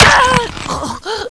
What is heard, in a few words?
girl die